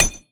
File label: Mine; Auric